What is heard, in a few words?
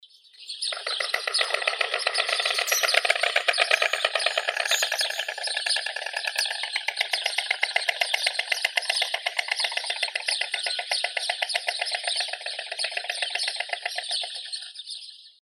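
white stork, Ciconia ciconia; aist belii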